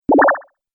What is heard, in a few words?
Laser Switch Button